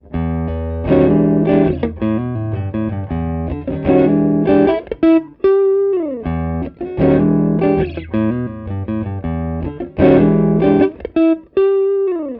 Multimic Facets Elec Gtr Right C3000

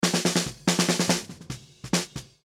Multimic Facets Snare Under

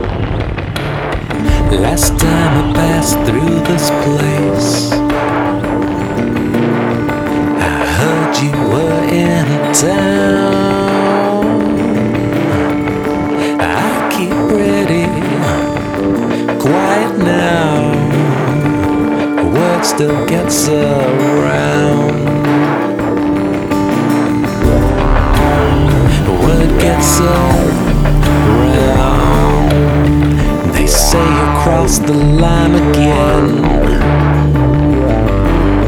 Vocal Context

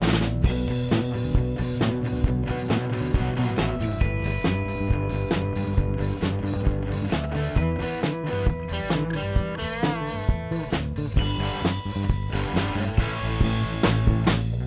Band Mix; Recording Mistakes

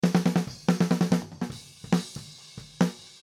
Distance Snare Mid

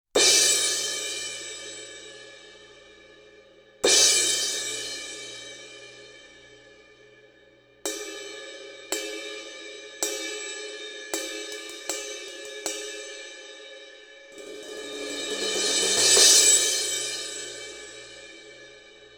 Cymb Crash Bell

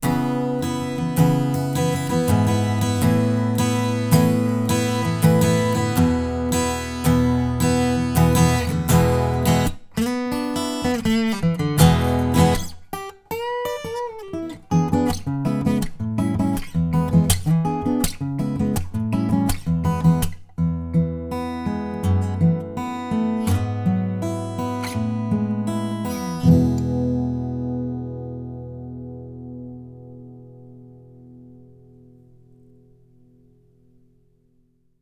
Distance Ac Gtr Cls

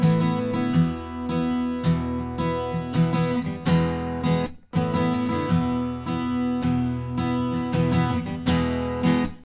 Ac Guitar Absorb Reflect; Recording Mistakes

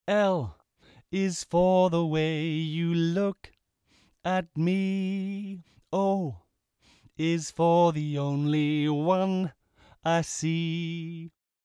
Off Axis Tone Figure 8; Recording Mistakes

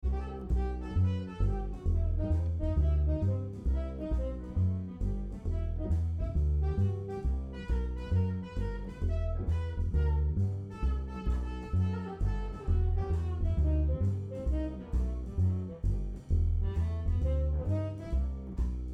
Spill Enhancement Solo Bass; Recording Mistakes

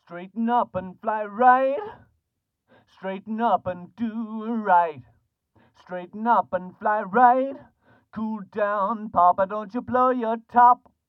Resonators Vocal Plastic Chopping Board

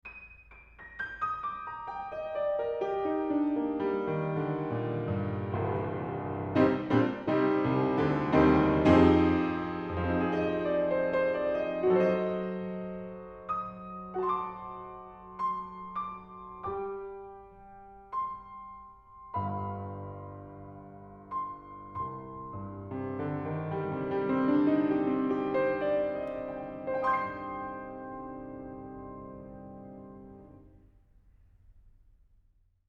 Piano, Distance 250cm Mic

